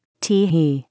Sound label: tee hee